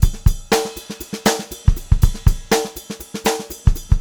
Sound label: FUNKY, Funk Groove Drumloops; USB Soundscan vol.38; AKAI; 90 s Sample CDs; 120 FUNKY